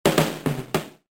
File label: drum